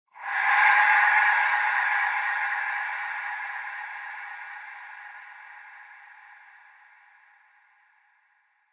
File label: Element Reverb, Horror, Element, Reverb, Breath, Ghost; Ghosts